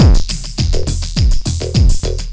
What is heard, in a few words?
Processed Beat, Drums & Percussion